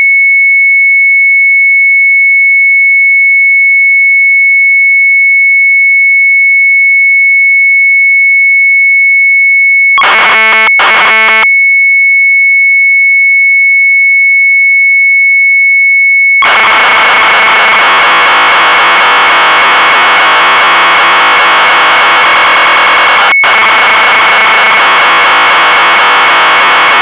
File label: MZ800 Tape, sharp; Fibich; retromelsarchive